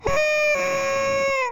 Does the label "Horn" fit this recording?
Yes